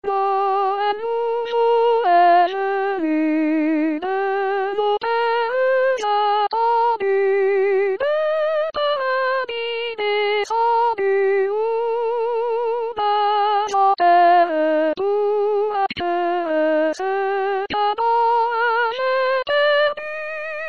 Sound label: Soprano; Noël nouveau est venu